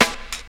snare single hit E key, snare drum sound tuned to the E note